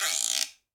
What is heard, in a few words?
Guiro B Long; Latin Percussion; Drum Hits; Wood; Ableton; Factory Packs